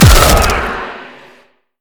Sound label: zone knockup; Zyra